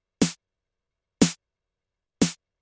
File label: Ambient House Snare 120BPM